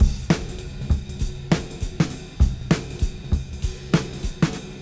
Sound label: Cym Beat 99 BPM Rev